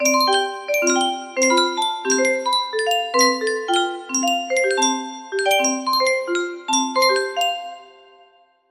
music box

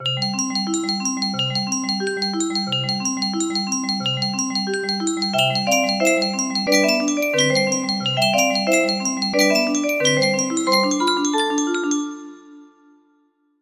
melody, music box

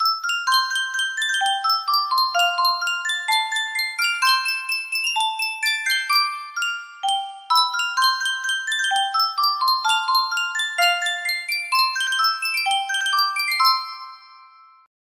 music box

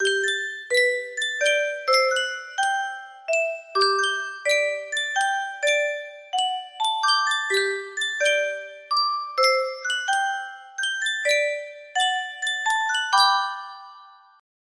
yunsheng music box, music box melody

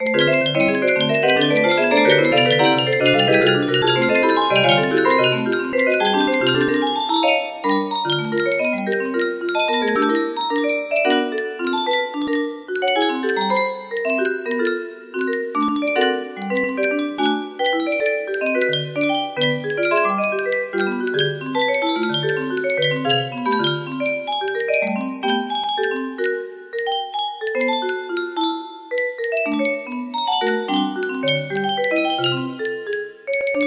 instrumental music box

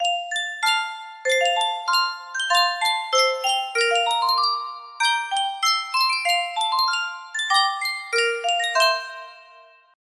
sankyo miniature music box

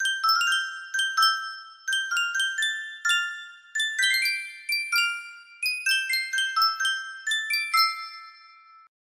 sankyo miniature music box

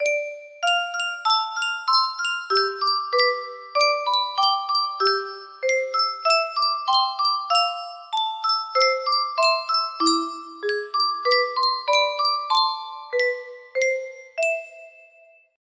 yunsheng music box unknown tune

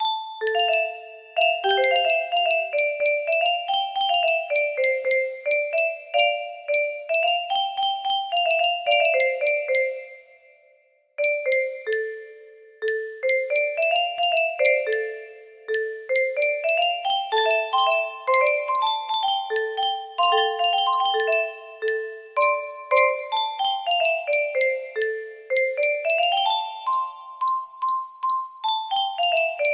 music box, music box melody

